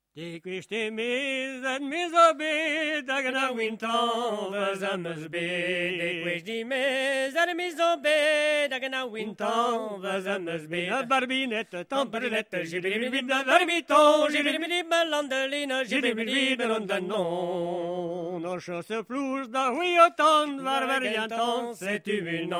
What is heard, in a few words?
Gavotte